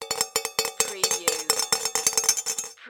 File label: Cartoon Drum Cymbal Tap